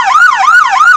yelp, sirens; whelen cencom

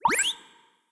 UI Bleep Open; blue archive

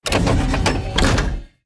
Heavy Tank Cannon; blue archive

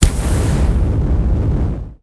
Shot Fire; blue archive

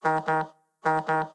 Vibration; blue archive

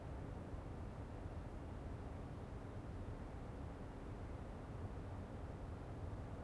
Restaurant; blue archive

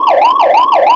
yelp, sirens; federal signal pa 500

